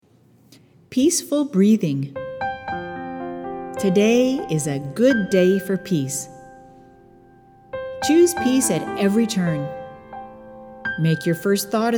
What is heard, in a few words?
Peaceful Breathing